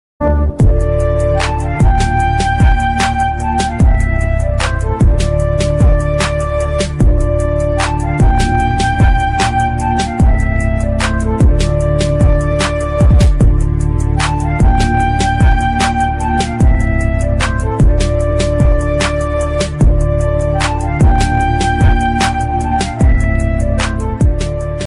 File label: new ringtone sad ringtone hindi ringtone mobile phone ringtone flute ringtones